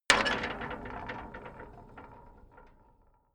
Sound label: metal gate close rattle